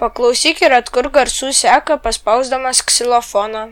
melody; gcompris-data

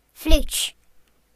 flute; gcompris-data

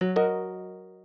Im Network Problems; oxygen sounds; plasma

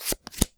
card flip; gcompris; memory

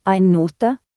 tux note; ktuberling; games

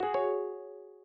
Im Irc Event; oxygen sounds; plasma

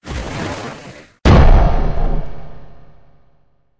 ship player shoot; knavalbattle; games

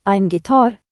tv guitar; ktuberling; games

